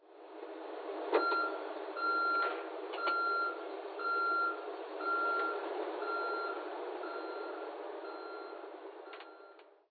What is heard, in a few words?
truck backup; ambient